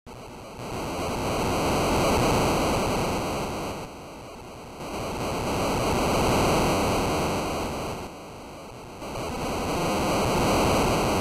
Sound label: wind; bgm sfx